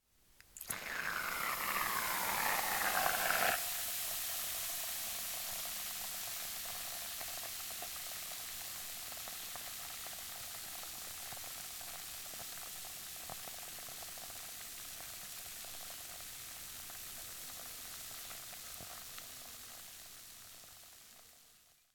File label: Foam